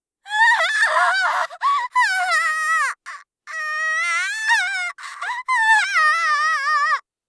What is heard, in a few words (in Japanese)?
火傷; 効果音なし